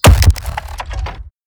Sub Thud; Bear-Trap elements; wildscape

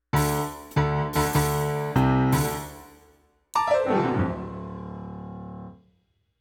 Dramatic Dancing

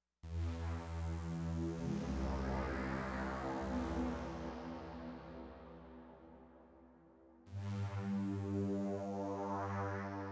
Synth Pad

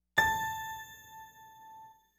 Light Bulb Ding; Broadway; SFX

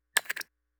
Nail Snap

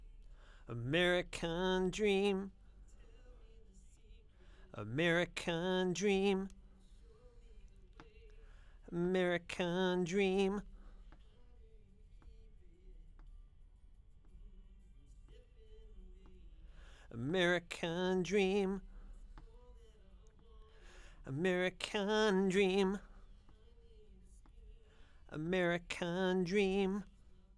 bridge lead vocal overdub

